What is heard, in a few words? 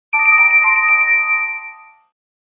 Pause tone; Samsung SGH-i550